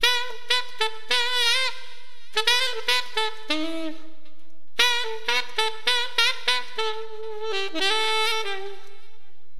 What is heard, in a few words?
ALTO, Wind; LiveAct Pool; AcidJazz Funk